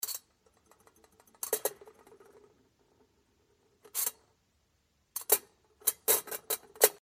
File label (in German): Fondue